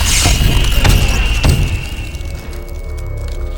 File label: time thumps; Terminator; Rise of the Machines